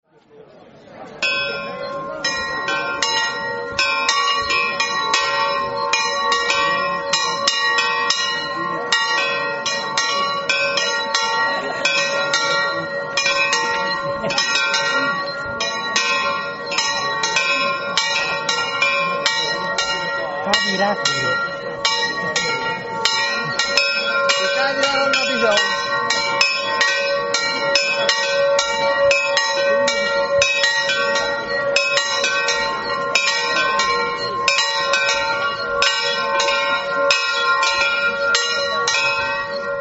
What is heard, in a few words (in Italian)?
casali, campane